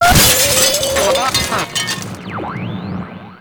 Screen Blast; Ambience; Props; Warframe; Nineteen Ninety Nine

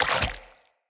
Root Spider Mother Step Water

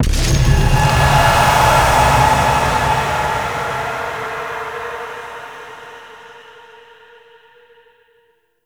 Hex Appear; gameplay; Warframe; Nineteen Ninety Nine; Stage 11 Future Past

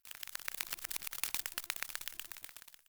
Idle Single Butterfly; Fairy

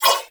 Nunchaku Prime Whoosh; Weapons; Warframe; Tenno

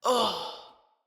Death Female; Battle; Envoy

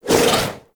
Speargun Throw Windup Foley; Warframe; Weapons

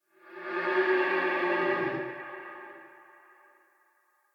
Stag Vocal Pain Idle Echo; Animals

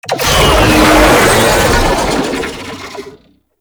Vocal Idle, Infested; Warframe

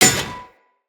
Melee Axe Impact Generic

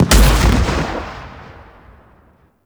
Corinth Prime Alt Fire Explosion A, Heavy Shotgun; Warframe; Tenno

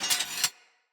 Split Sword UnEquip; Weapons; Player; Swords; Starter

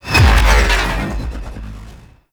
Space Fighter Elite Laser Warp; Warframe; RailJack; Corpus; Space Fighter Laser Agent

